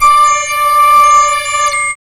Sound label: CYBER KIT, DRUMS